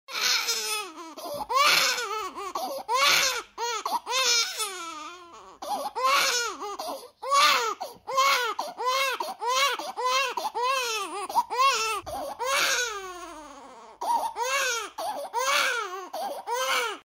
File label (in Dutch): huilbaby